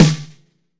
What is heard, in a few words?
chunky big snare; single hits